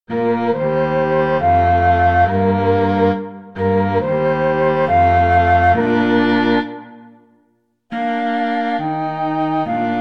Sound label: flute violin cello